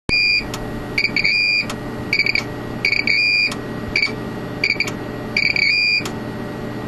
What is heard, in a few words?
HDD